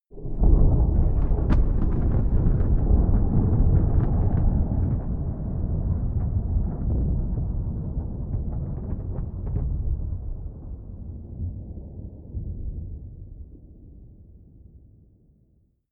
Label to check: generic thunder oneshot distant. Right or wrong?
Right